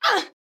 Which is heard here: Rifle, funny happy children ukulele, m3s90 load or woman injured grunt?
woman injured grunt